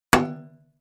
Aluminum Gutters

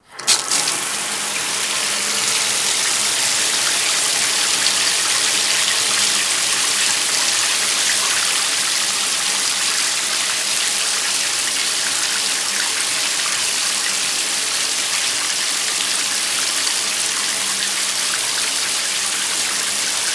Shower Turn On, Run, Turn Off

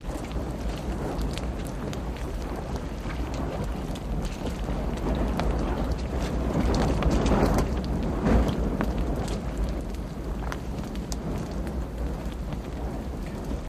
Fire Rubber Cement; medium

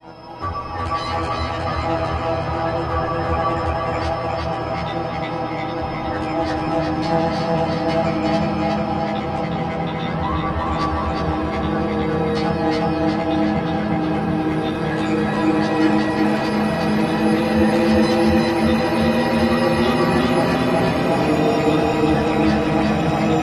Magic Oscillating Pitc, deep

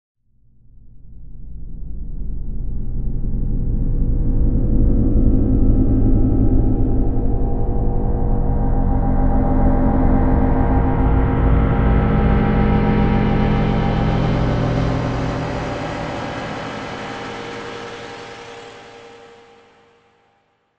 Space Ship Lift Off

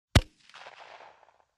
Dragon Missile Round, missile exterior

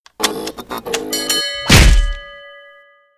Self Boxing Machine, cartoon fx

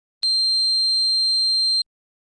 Beep Electronic High

